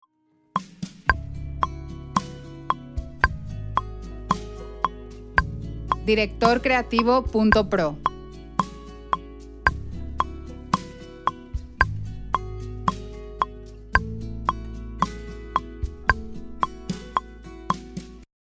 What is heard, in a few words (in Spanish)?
112BPM, 4/4, E